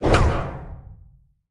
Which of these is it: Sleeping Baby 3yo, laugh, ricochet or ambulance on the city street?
ricochet